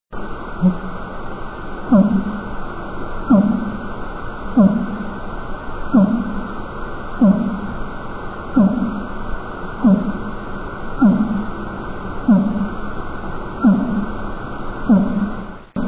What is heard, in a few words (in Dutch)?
vinvis; walvisstrandingen